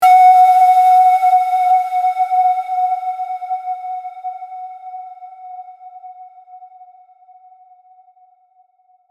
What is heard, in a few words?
flute, نی چوپان